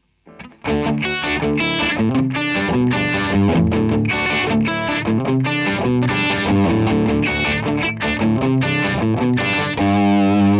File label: Gain Riffs